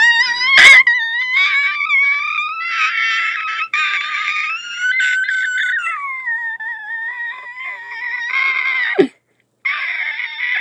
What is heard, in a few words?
scream